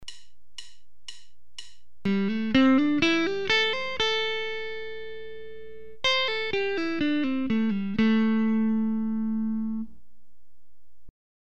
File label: Box I hammer-ons and pull-offs, guitar